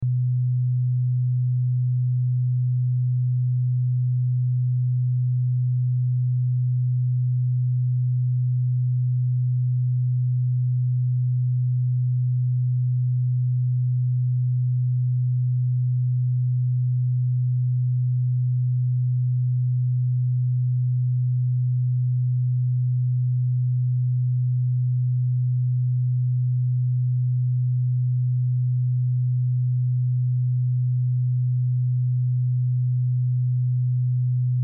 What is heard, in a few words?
Test Tone Sine Wave 125Hz -18Db Loop; 60 Secs; Technology; Blastwave